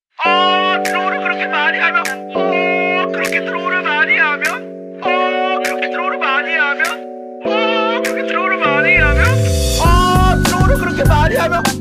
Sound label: music